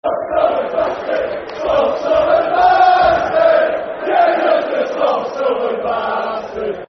Soft Southern Bastard [Football Chant (Soccer Songs)], Scunthorpe; Scunthorpe United; Scfc